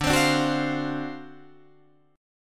D 7th Sharp 9th D down Guitar Open D

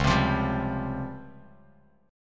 Db Major 7th Flat 5th, Bass Standard; 9,15,15,12; strummed; chord shape; JGuitar

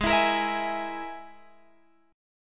G sharp Suspended 2nd Sharp 5th, Guitar Drop D; 18,19,20,21,21,x

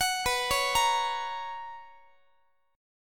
B Major 7th Suspended 2nd Guitar, chord; arpeggiated

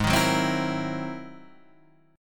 Ab 7th Flat 13th, chord, guitar; Ab7b13 strummed; JGuitar

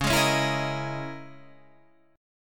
Db Minor 6th Add 9th Db down Guitar Standard; strummed; JGuitar

